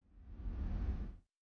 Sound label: Jet